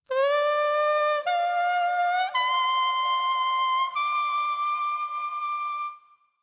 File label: kusabue short; anemoi パッケージ版